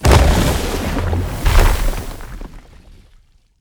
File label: rockslide